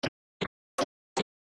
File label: Ticktock; Zelda; OOT